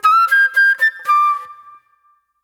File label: Soul Plate Flute